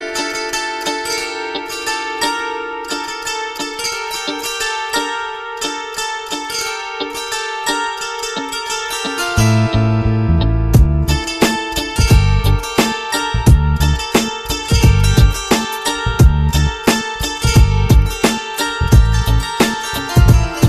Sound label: Tamil ringtones